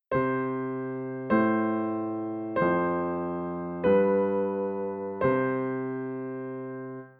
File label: Outlined notes